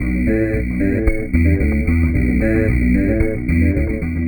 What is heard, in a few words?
Processed Electric Bass